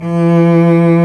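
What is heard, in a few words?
STRINGS SOLO, Chamber Grp; Roland L-CD702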